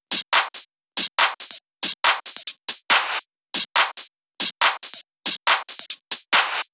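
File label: drum Loop 140BPM hi-hat Loop; Vengeance - Essential Clubsounds Vol.5; VEC5 Drumloops; VEC5 Multi Loops 140BPM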